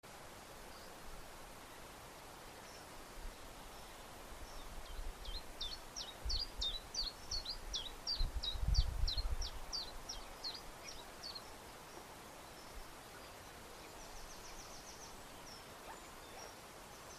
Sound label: Full sequence, tristis; Coton2014